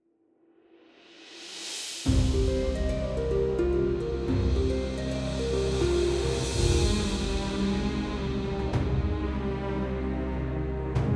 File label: Timeline BGM; AFK Journey; Android